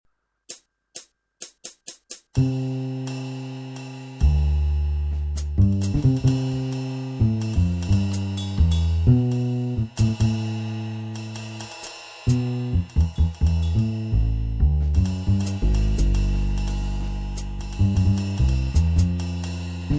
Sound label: Backing, jazz